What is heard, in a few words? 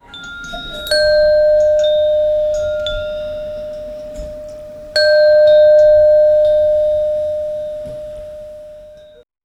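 buddhist temple sounds bells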